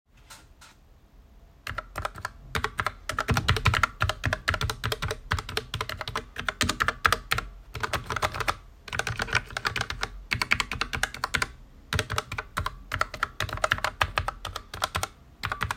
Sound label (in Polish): dzwieki, redragon k652 azure